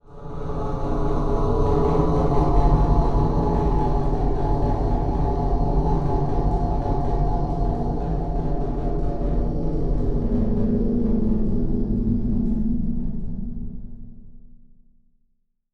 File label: Transition Metallic Drone; Production Element; Title Transition; AdobeCC